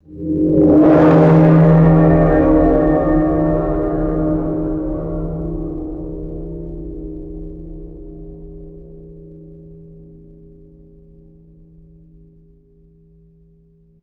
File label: deep resonant metallic gong strike; bells